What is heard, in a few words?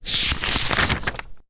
page